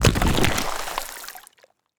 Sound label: creature wood texture water; Bluezone Corporation